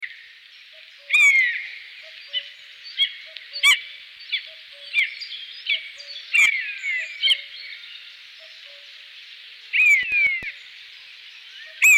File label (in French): buse variable buteo buteo